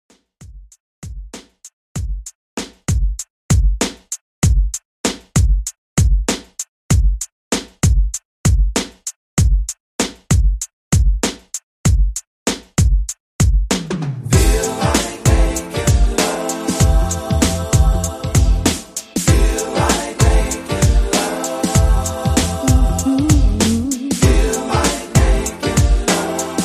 90s Redrum 97 Bpm

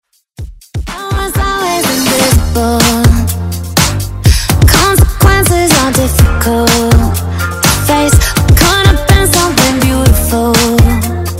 Pop Redrum

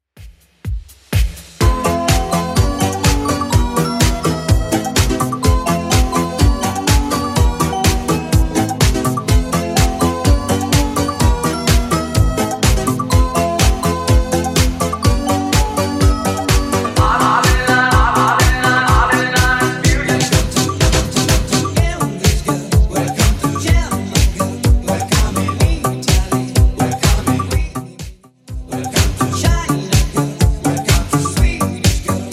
80s Redrum 125bpm

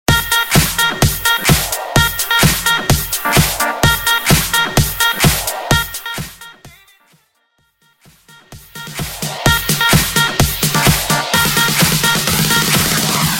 Tribal Mix; Vegas Edit; 128 Bpm

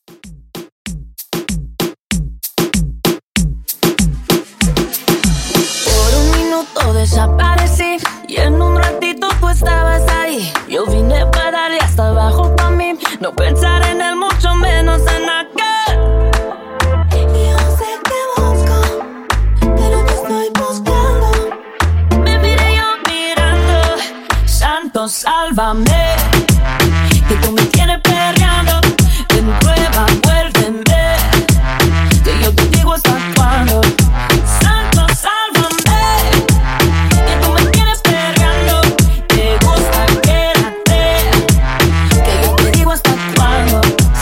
Reggaeton 96Bpm

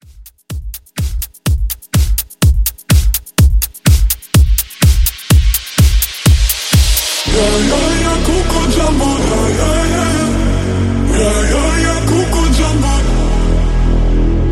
Bootleg Reboot 125 Bpm

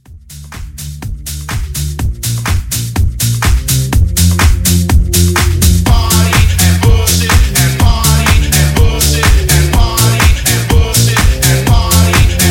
Club House

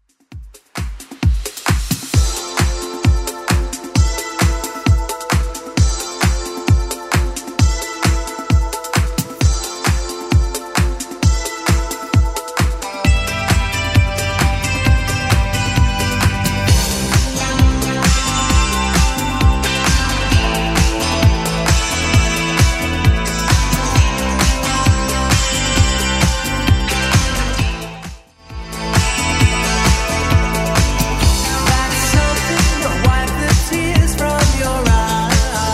80s club 132 bpm clean

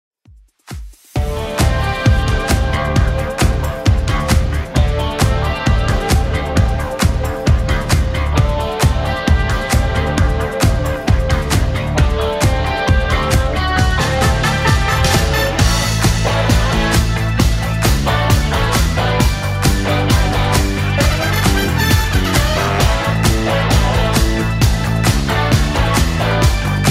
80s Club Edit 133bpm

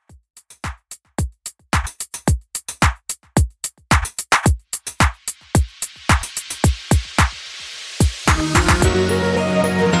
Quick Intro Redrum 110Bpm

